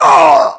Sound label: death, english